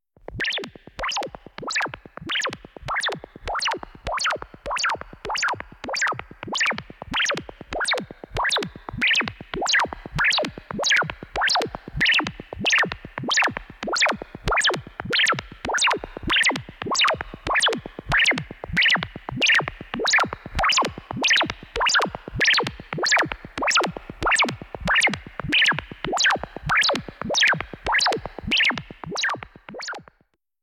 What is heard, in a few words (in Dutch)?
Electronica, science fiction effects; KMP Film Video Sound Effects